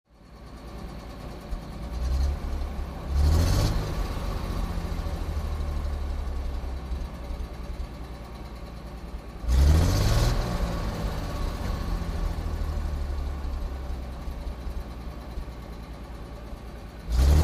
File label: Truck 2.5 T Int Big Revs; 2.5 ton